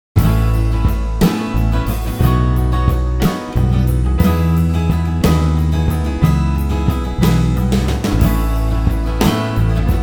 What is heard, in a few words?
(INSTRUMENTAL)